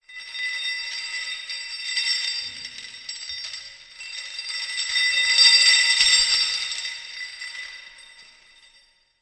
Chains Drag Reverb, Chains being dragged, metal sliding on stone sur; Horror; with reverb; Ghost; Lynne Music